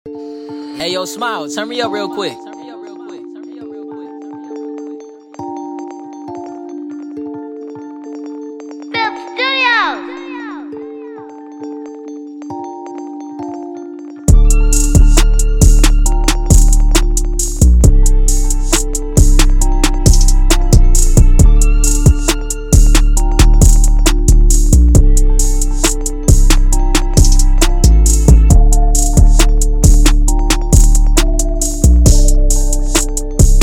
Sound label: pure water